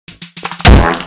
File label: fart; fortnite